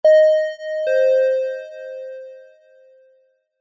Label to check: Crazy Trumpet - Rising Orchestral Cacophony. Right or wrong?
Wrong